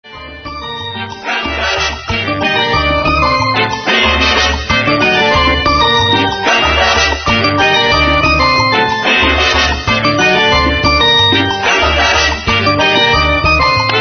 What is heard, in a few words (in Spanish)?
organo